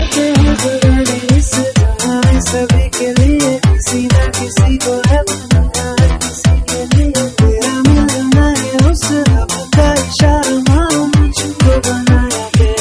softtone; blues

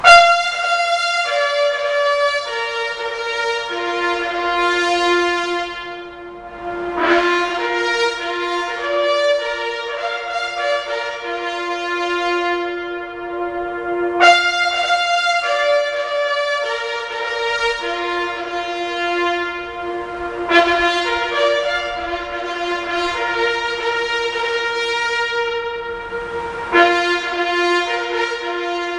Bugler under bridge

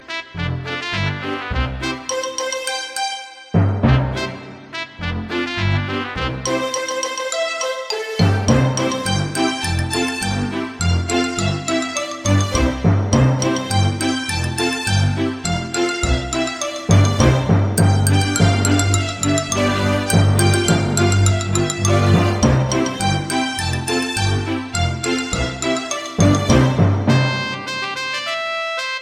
twinkle

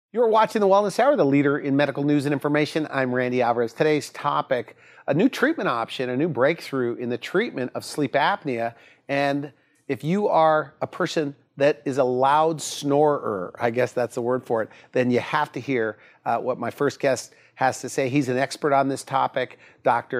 snoring; ZYPPAH

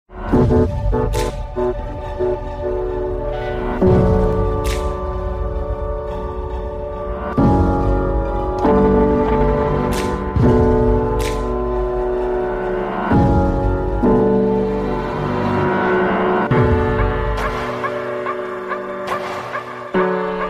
Apple Watch Ringtone

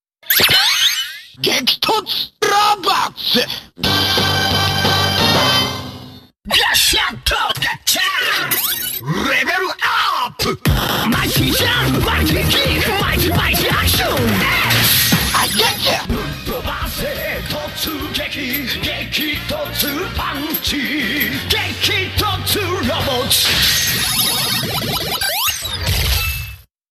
Game Over

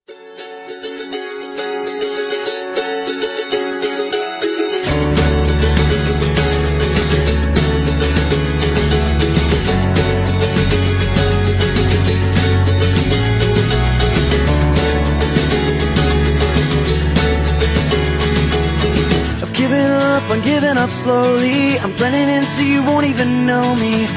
acoustic

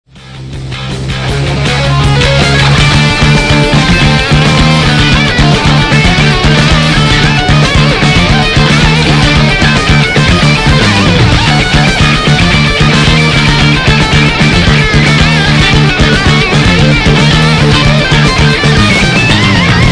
instrumental

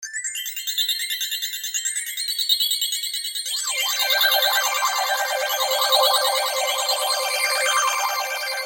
Sms

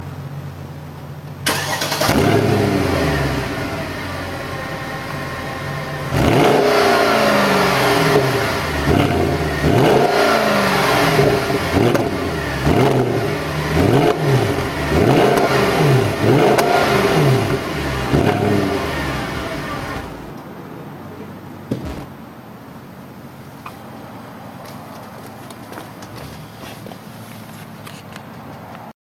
cls63 amg exhaust; ringtones

